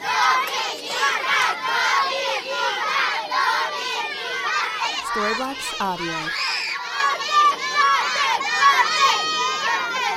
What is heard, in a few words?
applauding children chanting